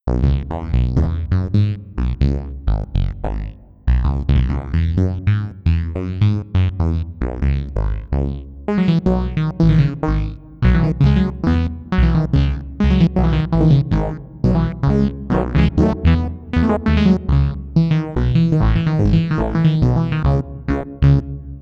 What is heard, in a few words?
bass sound without delay fx